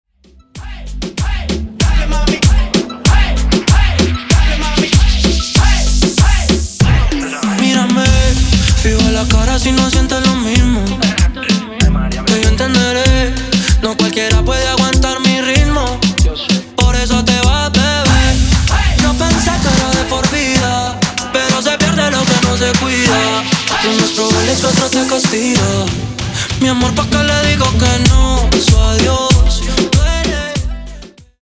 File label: Remix Intro Outro 96 Bpm